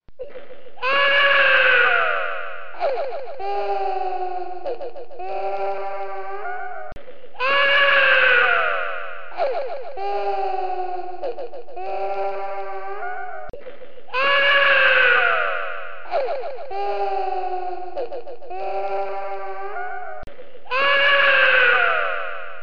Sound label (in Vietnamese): tieng tre con khoc doa ma; tieng ma keu